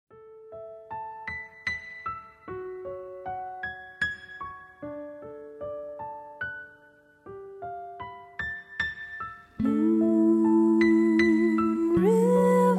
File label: bass; Bass Style Examples